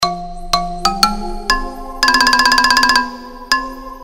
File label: Funny Suspense, ringtones